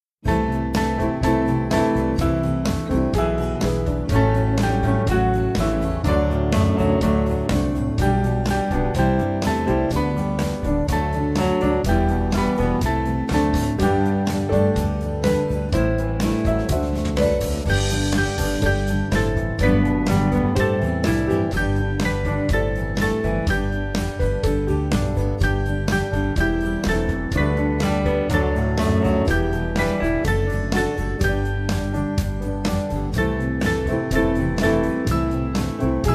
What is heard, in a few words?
Band; Jesus Hands Were Kind; Au Claire